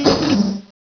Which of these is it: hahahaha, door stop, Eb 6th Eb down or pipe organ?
door stop